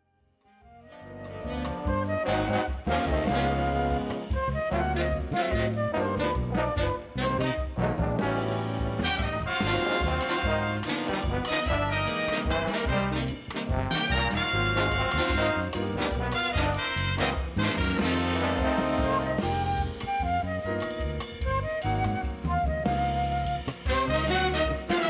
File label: Blues